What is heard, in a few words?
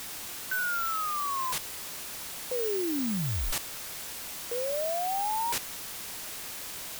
BusanSat; gqrx